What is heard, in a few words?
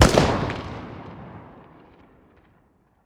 ak47 distant; weapons; Counter Strike Model Pack; CS:GO